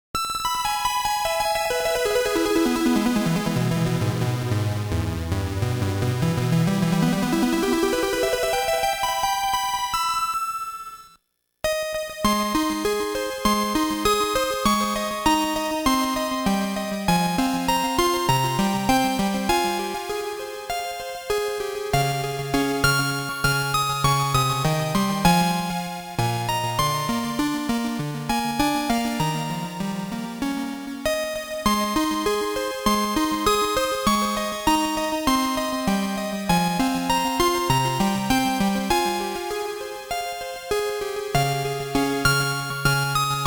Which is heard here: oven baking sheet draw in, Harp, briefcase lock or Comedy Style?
Harp